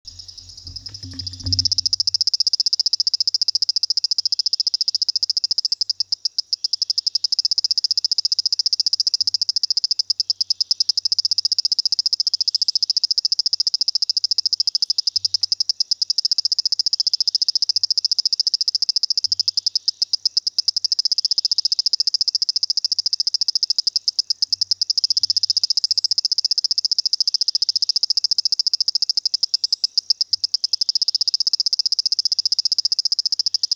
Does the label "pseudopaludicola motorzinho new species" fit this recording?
Yes